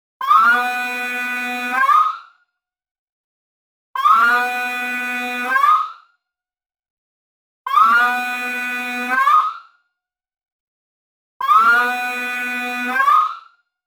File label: futuristic shelter alarm; safety